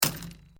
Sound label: Glass movement minor